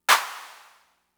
Clap, Fricke MFB-522, Drum Machines

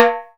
TIMBL, TIMBALES; AKAI S6000 CD-ROM - Volume 5

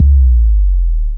DM BAS, Dance Mega Synth; Best Service

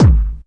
techno 2, bassdrum; Ueberschall; Techno Trance Essentials; DRUMS; BD TECHNO2

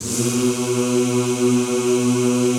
ZZZ B1, Classical Choir; Best Service; Extended Classical Choir

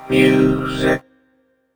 MUSIC, Vocoder Vox; E-MU Producer Series Vol. 8 – Platinum Phatt

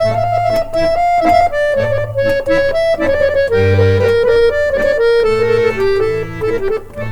ACC, Balkanish Traditions

